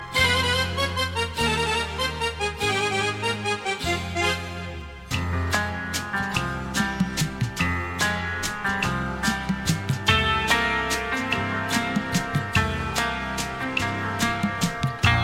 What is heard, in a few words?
Instrument